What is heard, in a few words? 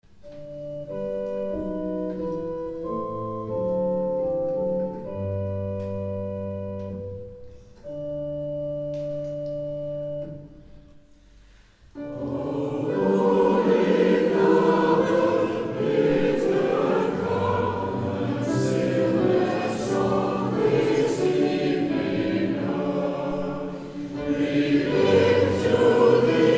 Choir, hymns